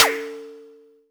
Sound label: blip snare; kidGlitch; Ableton Foundation FreePacks; Wicked Kits